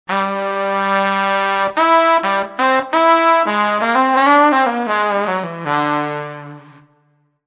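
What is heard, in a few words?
sax section; TURBO VL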